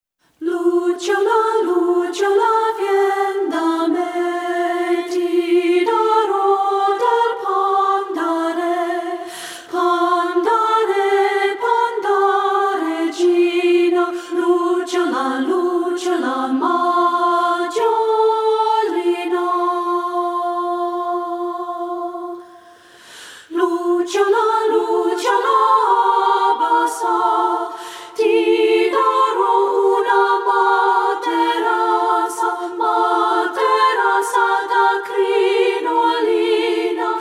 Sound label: A Cappella Italian Folk Songs; Lucciola